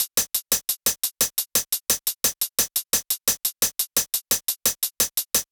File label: DnB 174bpm Hihats; EDM Drums; Drum & Bass; Stems; Ghosthack; Drum Mega Bundle; Ultimate EDM Drums; DnB Kit 10